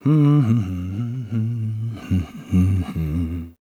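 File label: voice idle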